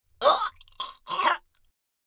Female old woman retching; vomit; gfxsounds

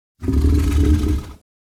Dragon quick growl warning

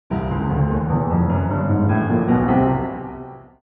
Cartoon danger

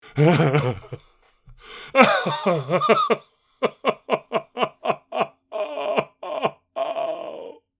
Aged man heartbroken sobbing

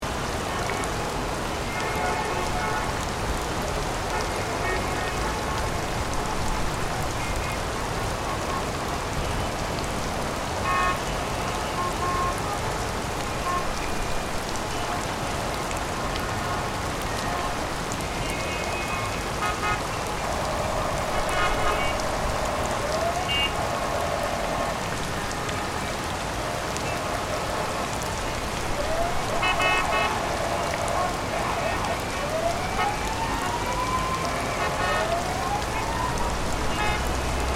Heavy rainstorm ambience city loop

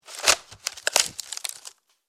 Firewood kindling movement; wooden

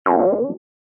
Human stomach growling rumbling hungry

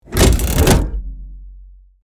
Huge mechanical lever pull; steampunk; gfxsounds

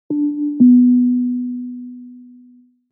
Flight announcement tone airport departure double

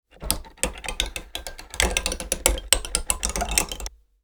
Gear mechanism rotating clicky; gears

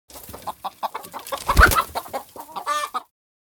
Chicken squawking flapping wings

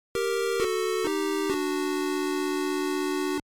Retro 16 bit or 8 bit game over tune

